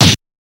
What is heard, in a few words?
Kung Fu Fight Sounds; Kung Fu Fight Sounds Pack